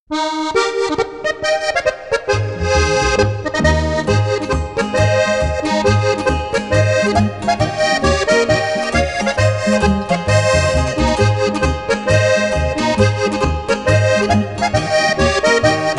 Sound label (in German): Harmonika